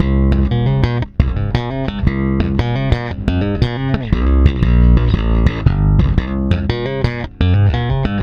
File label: PWR D, Bass, PWRSTRUM; Spectrasonics; Bass Legends; 128 PWRSTRUM